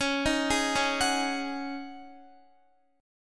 C sharp Suspended 2nd Suspended 4th, C sharp, Guitar; C#sus2sus4; arpeggiated